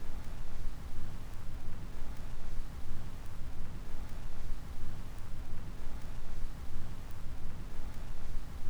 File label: Tape Noise; noise hiss crackle